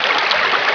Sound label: water